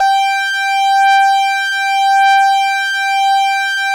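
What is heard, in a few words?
ARP lead G4; Sonic Reality; Vintage Timetraveler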